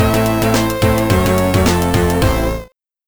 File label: Level 2 Game Over; Hoi; Amiga